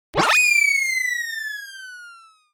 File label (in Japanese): hyun